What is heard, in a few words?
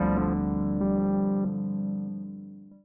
outro; molominol